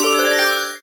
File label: stop bonus; Amatic; Casanova